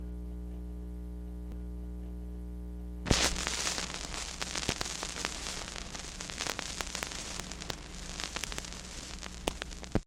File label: Needle On Vinyl Record; ToneBloxx